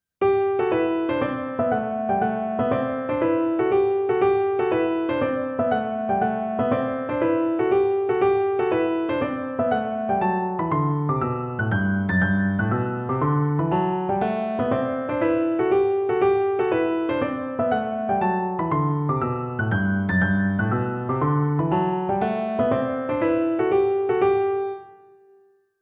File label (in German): Tonleitern Spielen G Dur dotted; Paradiddle Kapitel 1